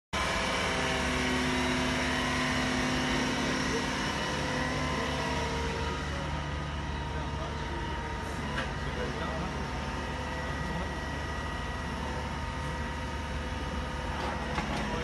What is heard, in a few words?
Drilling rig